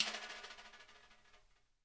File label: SNARE SN OFF; Sonic Reality; Interactive Drum Kits